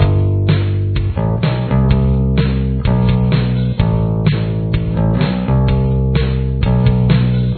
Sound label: chorus bass